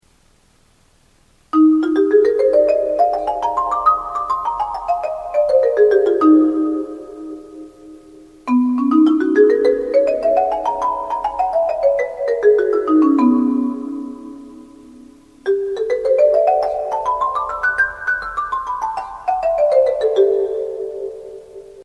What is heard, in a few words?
scale set